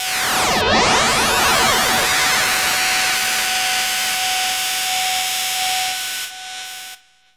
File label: SPACE, SYNTH FX; AMG; Global Trance Mission VOL-2